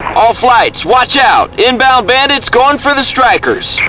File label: water; USAF; Mission Sounds; Germany